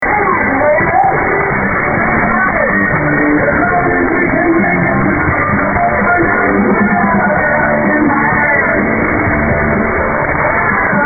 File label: ssb american themed music